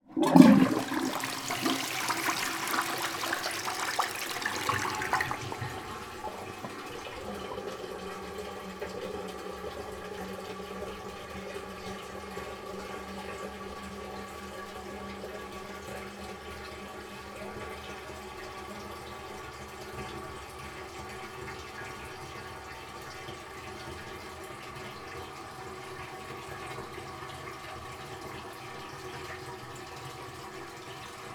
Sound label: toilet flush blocked water fill bowl cistern